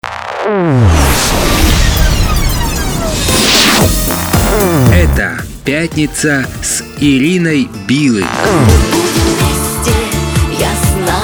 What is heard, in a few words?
jingle